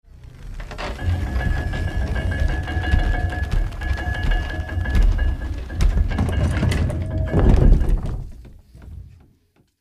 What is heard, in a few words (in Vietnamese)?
Âm thanh của bánh răng kim loại khổng lồ quay